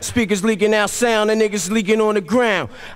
92 BPM

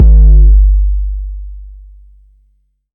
808's

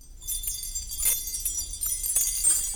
Sounds

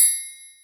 triangle

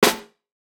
Flame Snare; Snares

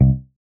bass, 808s

